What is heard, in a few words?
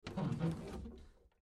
Closet Door Open, Closet Door Open Squeak Soft; Closet Doors; Household; Blastwave